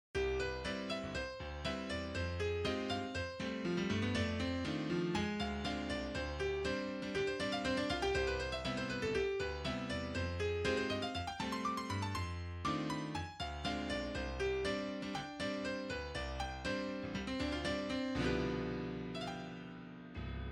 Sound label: groove